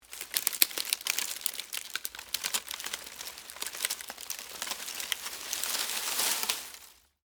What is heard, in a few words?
Foliage Crunch, Movement, Palm, Frond; Texture; Rustling Sound Effects; BlastWave